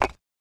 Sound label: rooftile; stone